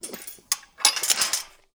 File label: CLASP Metal Gate Mechanism Unclasp; don t be afraid the first toy